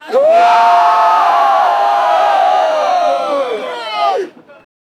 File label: angry crowd shouts booo; anger